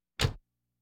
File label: Automobiles various